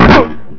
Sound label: bump